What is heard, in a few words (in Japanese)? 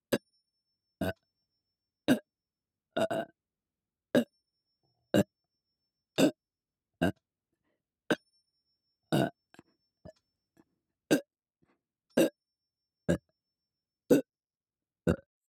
右耳 4拍子 「え」 の ゲップ メトロノーム; 月賦村レポート作成資料〜連続ゲップ真穂の練習〜